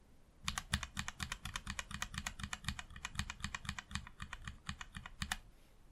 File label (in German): ESC-Taste